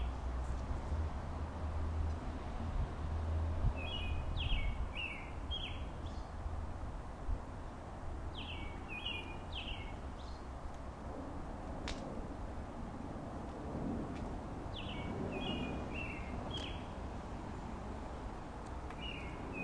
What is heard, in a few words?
birdy couldn't find the bird singing but sounded so cute